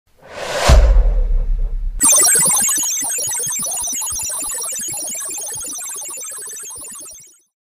whoosh sci-fi

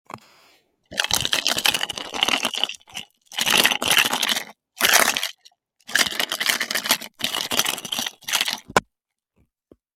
mysterious food sounds

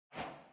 achievement; minecraft

